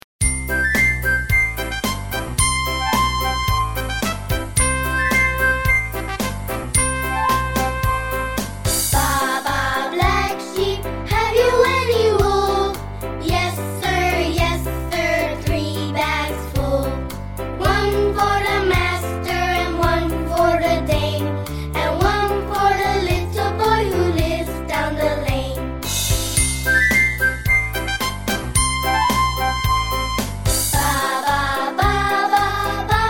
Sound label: english songs for kids